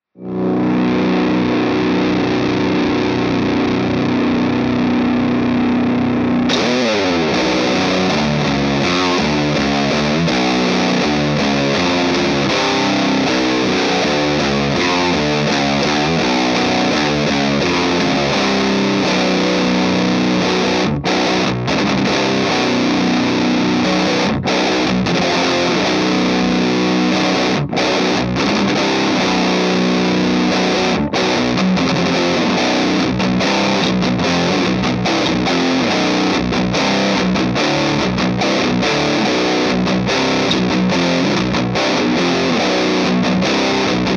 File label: extremely rotten distortion